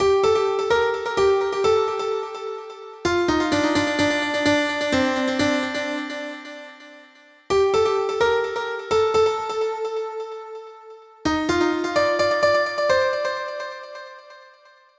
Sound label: Melody